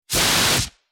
Short Heavy Fire Exti, fire extinguisher

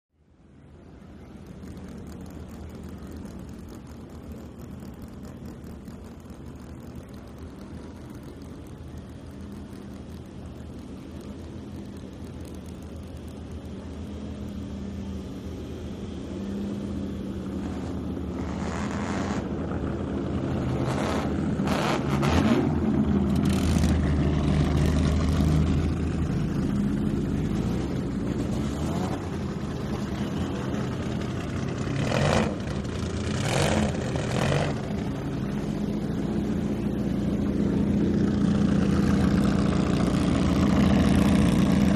Vintage Car Race Pre

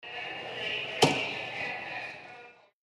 Stamp Ticket Passpor, airport

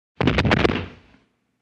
Body Fall High

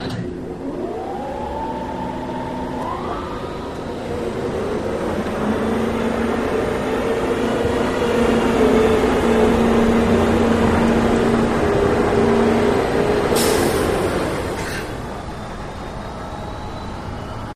Hydraulic Lift Wind Up, Hydraulic Whine, Chuff

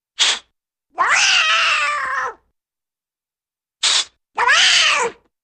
Cat fssst Yowl Hiss

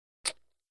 Poker Chip Stacked; casino games chip mvmt

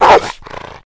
Rottweiler Vicious

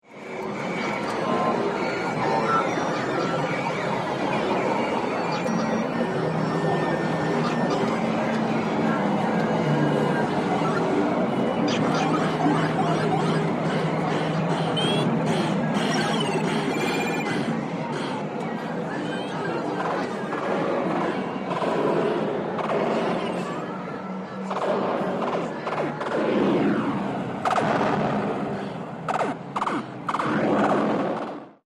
Video Game Arcade, video game

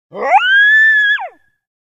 Coyote Howl, Single. Medium Perspective.

